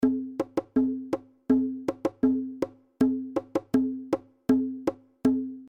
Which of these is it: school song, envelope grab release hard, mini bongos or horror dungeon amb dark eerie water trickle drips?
mini bongos